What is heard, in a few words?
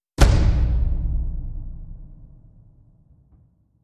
Drum Hit